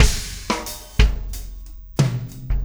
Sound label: Basic Beat